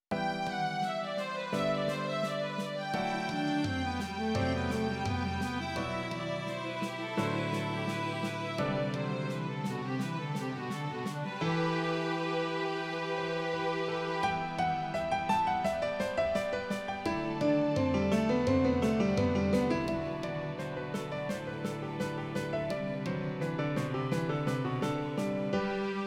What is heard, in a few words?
baroque